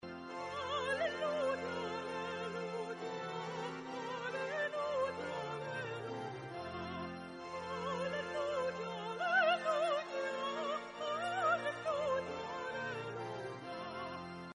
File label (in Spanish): Aleluya; Mozart; repertorio para bodas